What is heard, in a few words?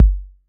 BD 808